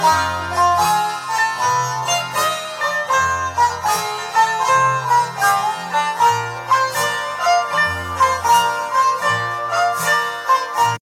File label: banjo lick